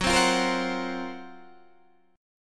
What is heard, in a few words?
F sharp Major 7th Flat 5th, Guitar, DADGAD